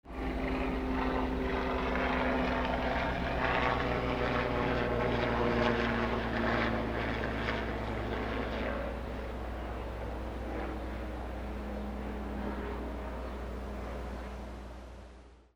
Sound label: flight